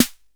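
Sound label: Boss DR-110 snare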